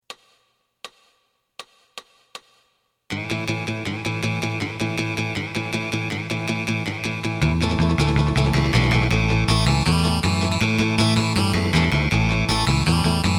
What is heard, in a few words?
drum